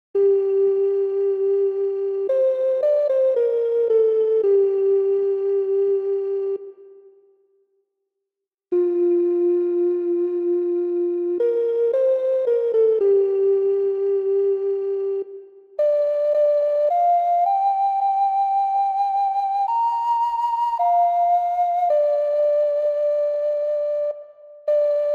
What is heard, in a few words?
Flute Ocarina; The Hobbit An Unexpected Journey; DAL Flute